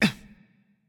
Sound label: greek hit react small; npc; smite; roman archer